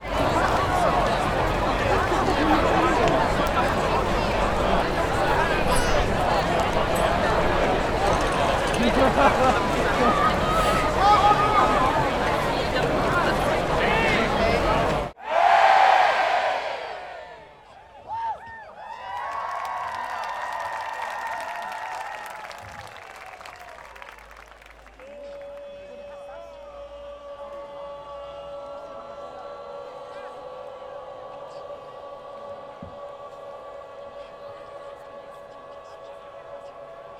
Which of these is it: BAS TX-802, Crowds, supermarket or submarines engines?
Crowds